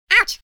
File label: uuh; wormux